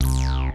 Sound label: BASS